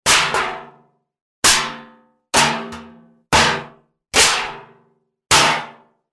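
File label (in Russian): стучит по металлической банке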